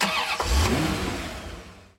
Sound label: Corvette Ignition